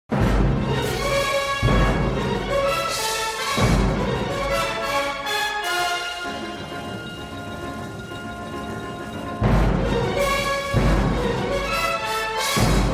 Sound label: Symphonic Dances, Fugue (Allegretto) (Instrumental); Symphonic Dances from West Side Story